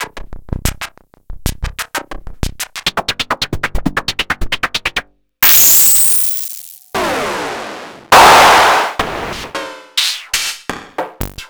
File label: Hat c